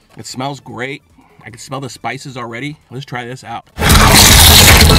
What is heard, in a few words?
eating chicken super bass boosted